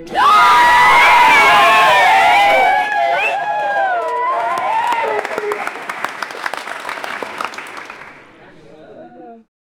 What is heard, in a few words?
scene for a wedding